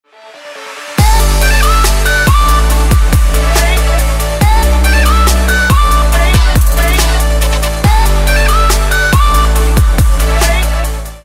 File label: twitch follower sub donation sound alert